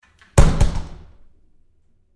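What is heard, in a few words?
Door, Bathroom Stall, Close, Close Up, Med; Household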